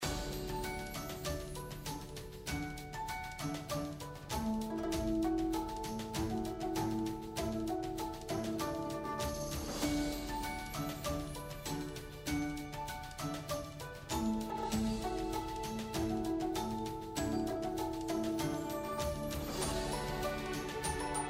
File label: BGM Main UI; Chinese New Year Deluxe